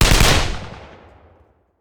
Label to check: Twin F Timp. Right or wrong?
Wrong